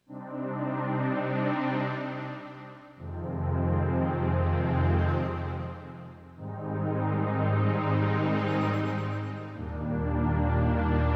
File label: Synth